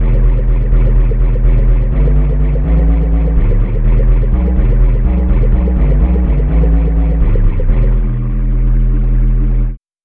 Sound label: ocarina bass